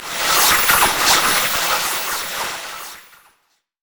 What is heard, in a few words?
burst of speed; LoL; fiora